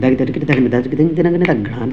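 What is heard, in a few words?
TABLA 124 BPM; RHYTHMLOOPS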